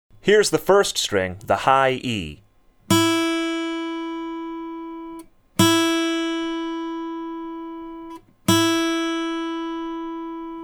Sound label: Tuning Notes